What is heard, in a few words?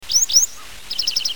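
Japanese wagtail; segurosekirei; toritori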